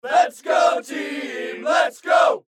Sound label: crowd lets go team